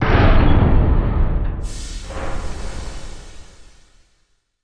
ship dock harddock

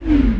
wep executioners hack

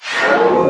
force healing start

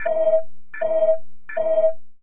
ui quest spawn enemy

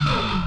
bonk

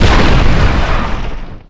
exp medium generic

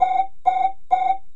ui quest spawn boss

